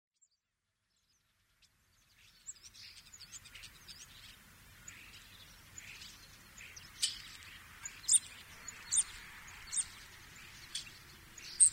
hoshimukum, watari

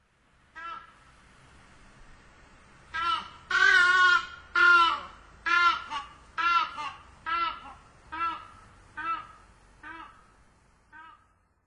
toki, sado

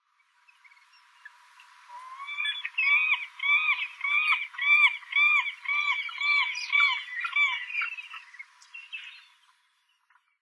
washikamome, nemuro

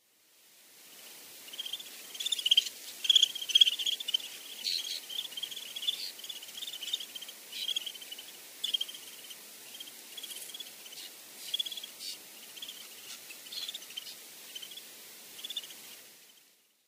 yukihojiro, sakata

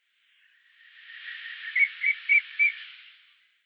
onikakkou, ishigaki

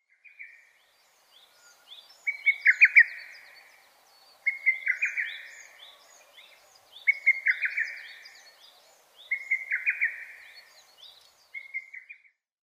nakigoe hototogisu

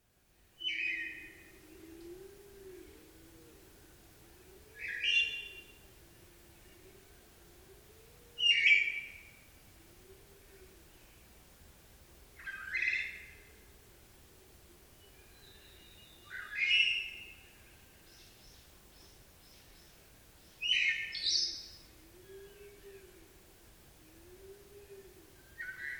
otora; kinsakubaru

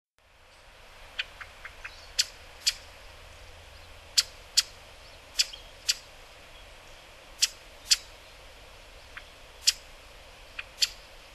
shirohara, hyogo

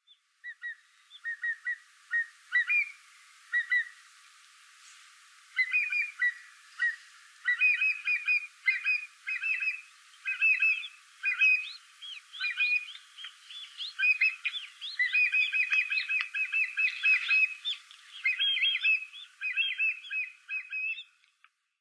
kumataka, tottori

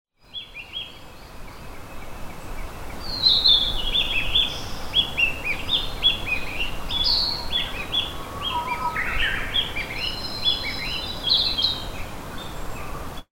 kibitaki kojukei; mane

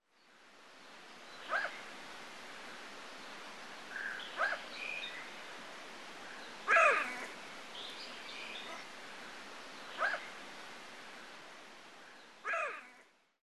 yamadori kosado

